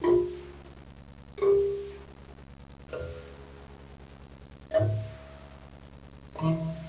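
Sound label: marimba; WAV Sound Effects; music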